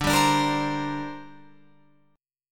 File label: D 7th Suspended 4th Sharp 5th down Guitar Open F; D7sus4#5; strummed